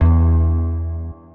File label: Bass, One Shots; Touch Loops; BlissedOutBeats